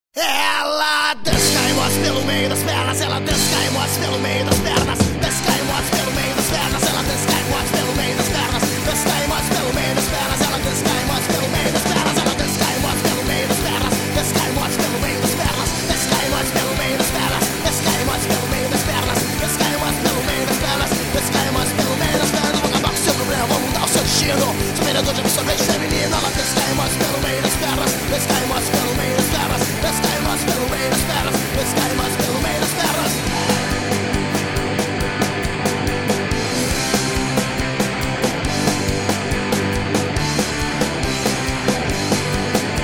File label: punk rock